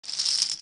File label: remove jeton, roulette; Roulette Royal